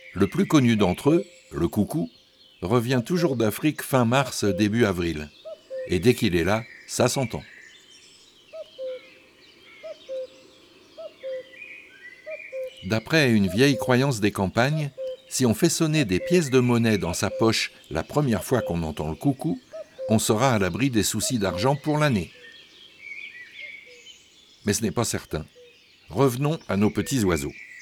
Le Coucou gris, chants d oiseaux

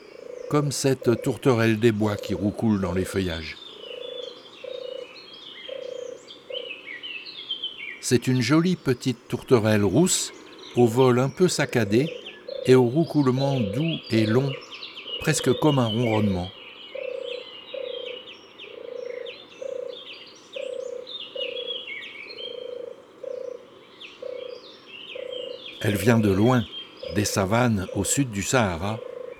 Mai La Tourterelle des bois; chants d'oiseaux

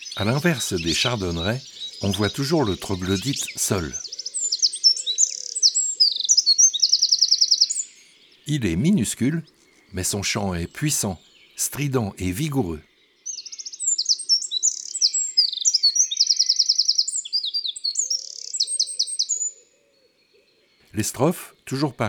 Le Troglodyte mignion, chants d oiseaux

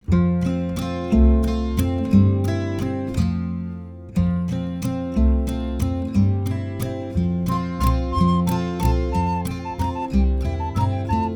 Le pinson et la mesange Instrumentale

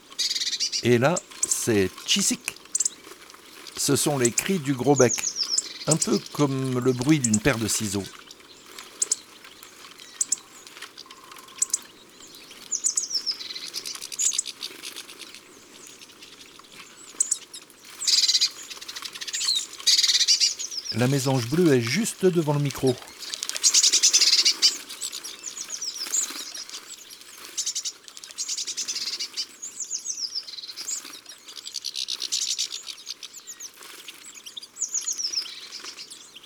Mangeoire Le Grosbec casse noyaux, chants d'oiseaux

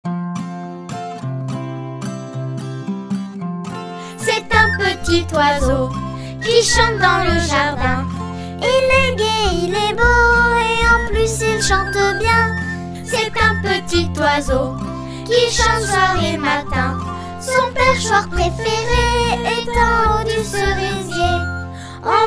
Chanson

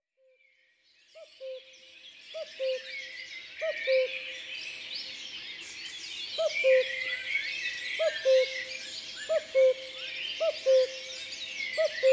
Coucou gris, Concerts Naturels de la Drome; Studio les Trois Becs

